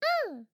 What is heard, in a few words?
koala short